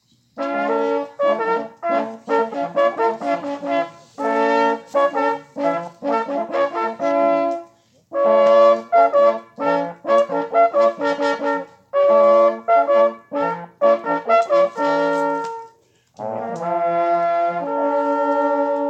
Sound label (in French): Hommage De Chasse Sonnerie; Societe de Chasse de l.Estenbach